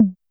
Tom Analog Soft, YAMAHA RM1X

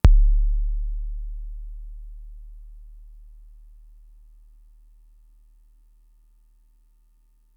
kick, Fricke MFB-522

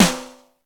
D70 SD, D70 DRUMS; Crypton - DrumBase5000

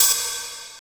snare+hat; DRUMS

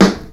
Skaboom; Mo' Phatt; Digital Sound Factory Mo' Phatt

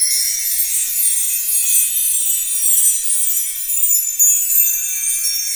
BELL TRE, HellTrees; E-MU Producer Series Vol. 5 – 3-D Audio Collection; 3DSFX

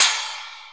CYMBAL; E-MU Formula 4000 Series Vol. 1; Hip Hop Nation

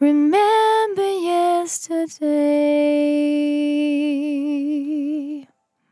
On A Star 65bpm F, Vocal; Zero-G

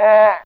Shout; Fairlight CMI IIe; Humans; Humans1

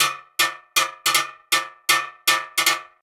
80 metal; Zero-G; Monster Beats

